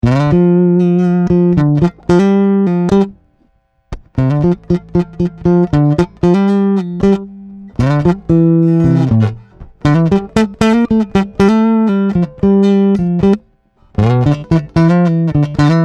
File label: Boogie Lead; suhr classic j 4 string